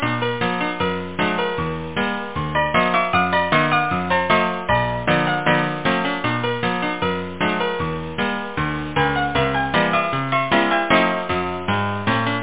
BARMUSIC; Nukem3d

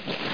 pull

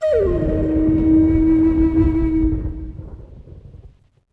menu popup; nature desktoptheme; ARIZONA

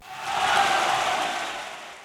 applause; frozen bubble

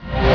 CHIME

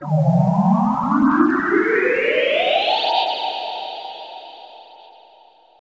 synth pad ambient 160 C; Sphere

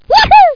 yahoo; worm

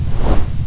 VIBRO; CHASM

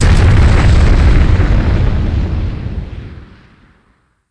blast; groundwar

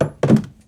drop Heavy Stuff; Singles Flirt Up Your Life

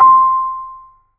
Percussion A4; SoundFont - The Developer's Choice - Volume 1; b3fast

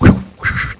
spear; rott

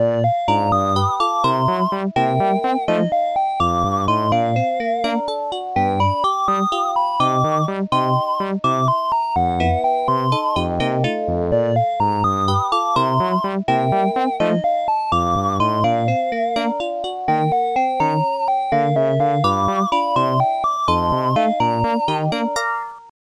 tune

